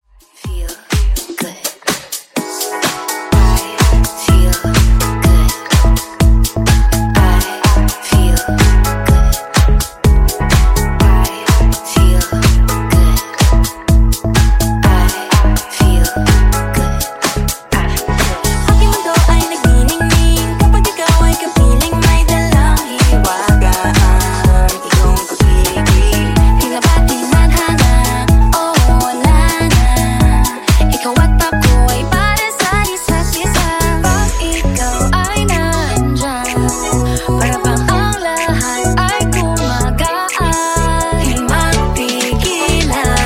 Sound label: Funk 125Bpm; Bootleg